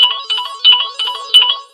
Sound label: Samsung Galaxy Tab A8.4 Sms Ringtone